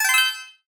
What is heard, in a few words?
bright